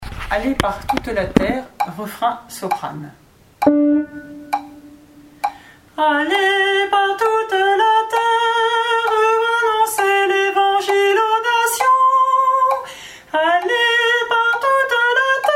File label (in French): REFRAIN SOPRANE; Allez par toute la terre